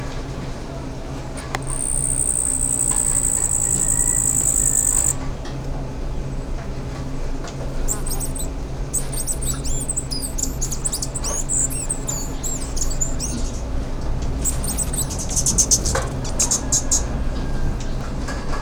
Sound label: Bee hummingbird; مرغ مگس زنبوری